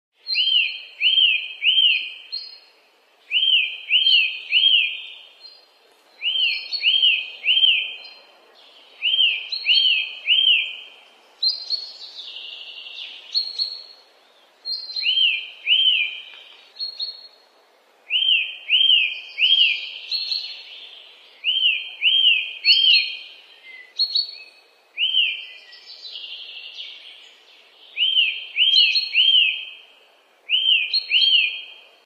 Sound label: Bird Chirping